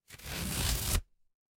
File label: paper fabric tear